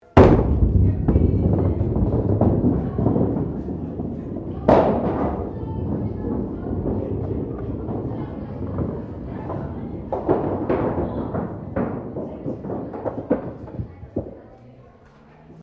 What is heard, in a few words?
Bowling Alley 18th Century Bowling; Colonial era objects; Bowling (Sound Effects:Sports)